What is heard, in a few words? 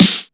good steel snare drum f sharp key